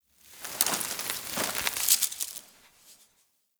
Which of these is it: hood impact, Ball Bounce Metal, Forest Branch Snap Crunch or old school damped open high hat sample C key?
Forest Branch Snap Crunch